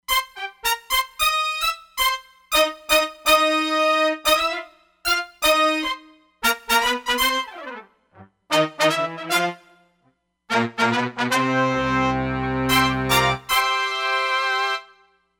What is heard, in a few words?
Pop Brs wAtk, Roland Fantom XR